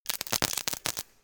Substation One shot

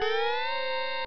Item Big Gum Scream; pac man kart rally java

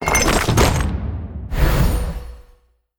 sturdy appear; Animation Throwdown

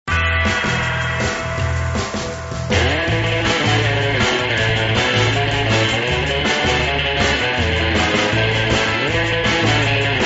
Deck the Hall (surf guitar)

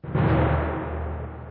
Tymp

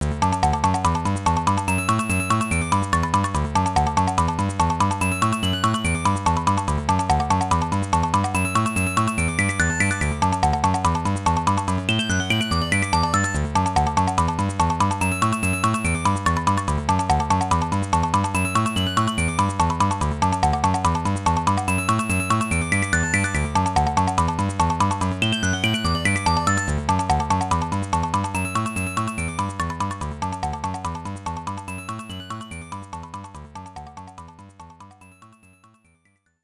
Conversation Screen; Densetsu no Starfy 2; GBA

